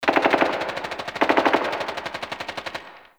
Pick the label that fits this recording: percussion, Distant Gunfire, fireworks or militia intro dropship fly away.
Distant Gunfire